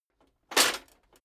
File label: metal plate armor movement single